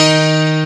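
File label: Distorted Piano high; instrumente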